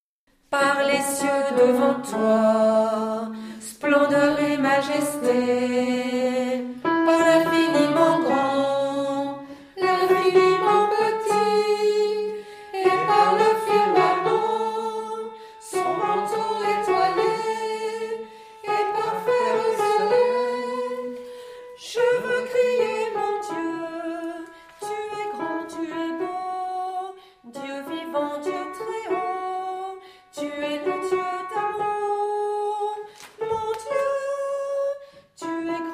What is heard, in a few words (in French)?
Psaume de la creation - Alto